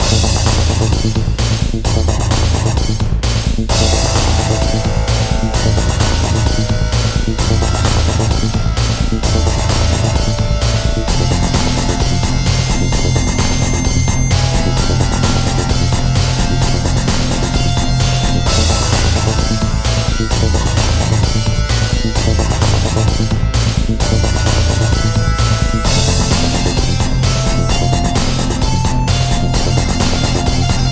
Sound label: game bgm; incredible hulk chitauri takedown